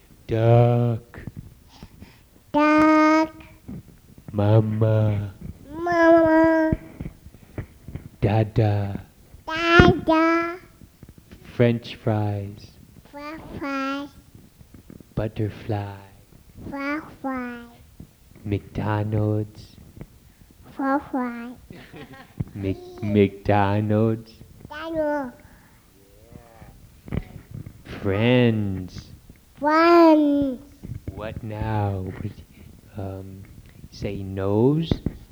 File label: duck